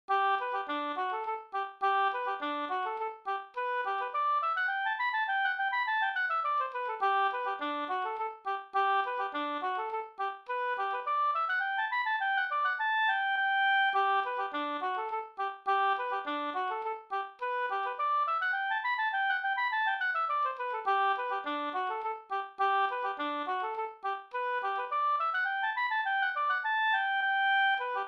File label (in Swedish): slangpolska